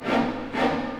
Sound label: Orchestral Loops; 128 PSYCHO